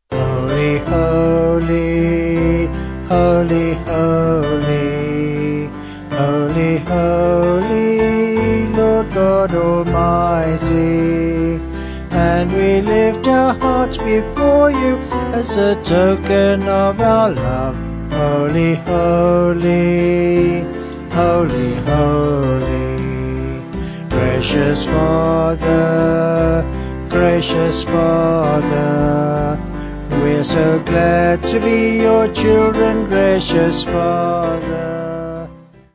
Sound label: Vocal Band